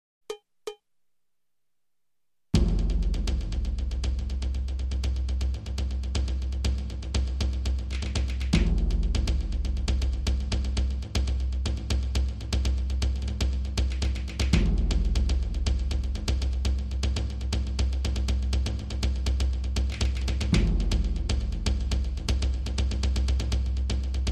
Japanese Loop

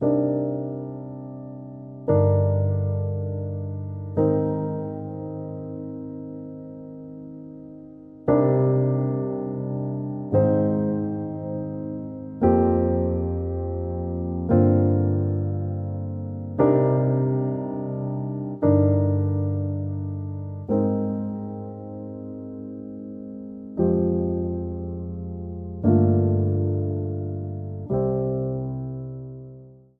Solo Piano

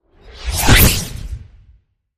Spell Cast Flash

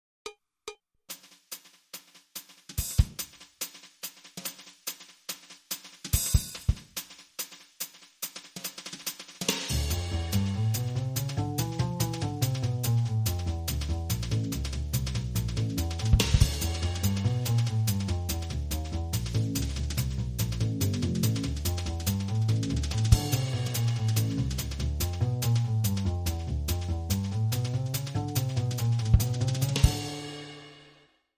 Jazz Brushes Loop, Split Mix

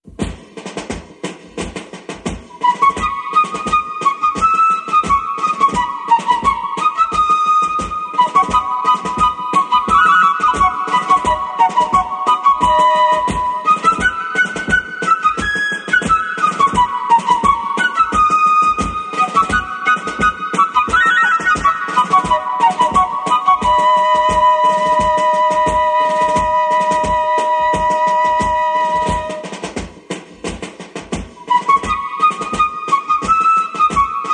Victory March

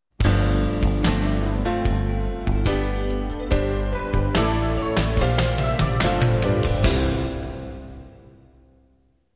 Counterpoint

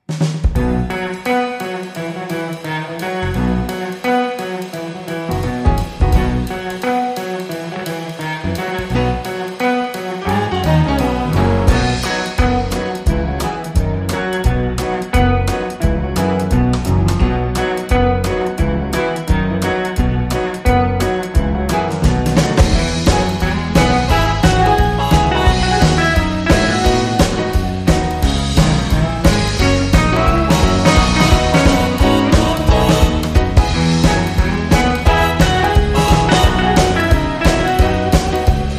Clean Instrumental

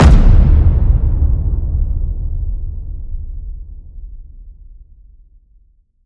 Titan Step Hit

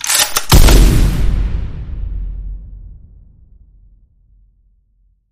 Last Stand Reload Hit

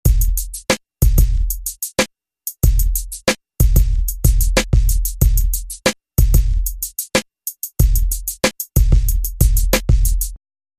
Drum Loop

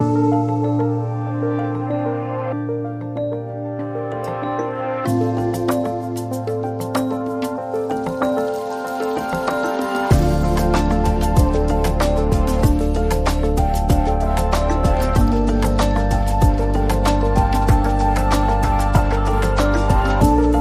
Aeolian Rhythm

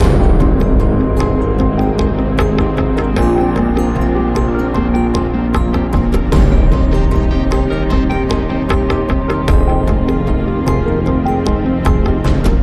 Cinematic Evolution, Loop

